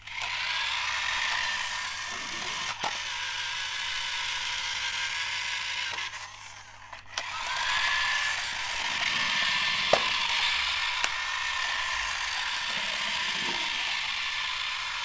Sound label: remote control car for toddlers on a wooden floor